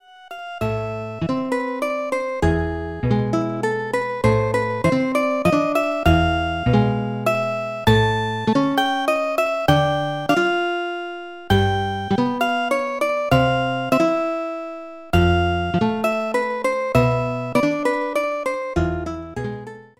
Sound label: sad song; super mario rpg